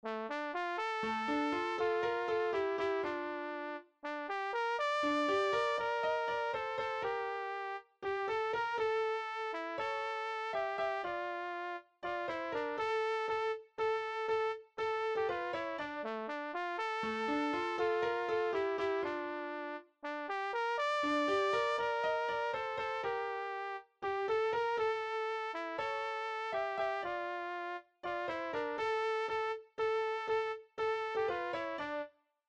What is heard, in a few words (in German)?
melodie, Übehilfen für das Erlernen von Liedern; ref gesangbuch; rg 168 hewenu schalom alechaem